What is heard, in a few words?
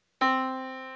yamaha Disk